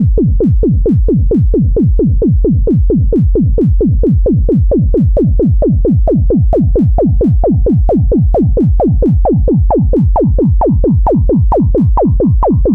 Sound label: heartbeat kick